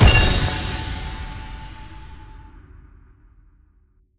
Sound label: large Win; netent